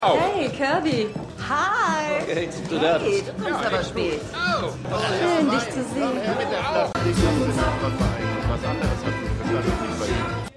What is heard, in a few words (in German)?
Partygaeste; Shining Girls